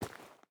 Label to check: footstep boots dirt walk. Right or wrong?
Right